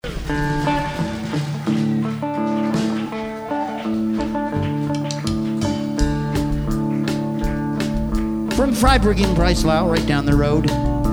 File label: Contemporary Rock Band